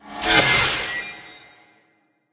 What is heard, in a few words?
Teleport Out; Level16 Veznan; Kingdom Rush Vengeance; tower defense